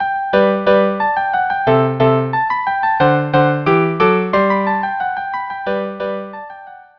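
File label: Allegro for mechanical organ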